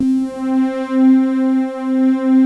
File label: RESONANT; Pro-Rec - Synthsonic; Z1 PADS